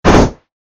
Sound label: acceleration